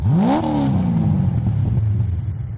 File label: starter; BALLY